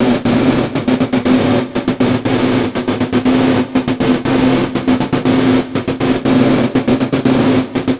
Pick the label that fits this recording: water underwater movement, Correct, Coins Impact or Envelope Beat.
Envelope Beat